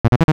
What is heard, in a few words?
enemy attack; mother2